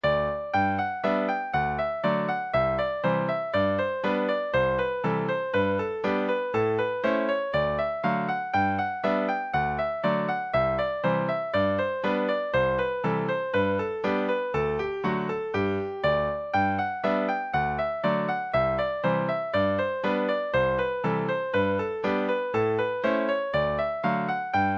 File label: bluegrass, session tune midis